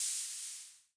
Hi Hat Cymbal Long, rhythm king; classic machines

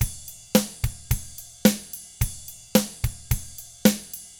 POP ROCK Drums; 109POP ROCK

